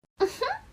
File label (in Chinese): 女生开心